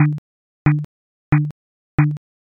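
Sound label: footsteps flooring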